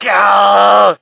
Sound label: crowd, shout; Mike Tyson Heavyweight Boxing